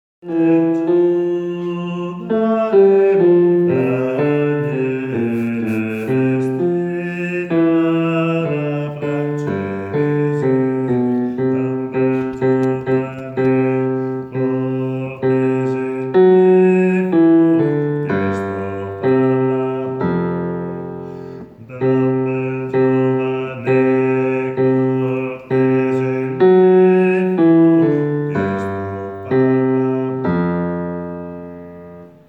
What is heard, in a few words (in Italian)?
bassi; la vien giu